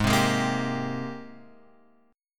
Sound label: Ab Major 9th, down, Guitar, Open F; strummed; AbM9; JGuitar